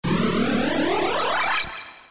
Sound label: Power Up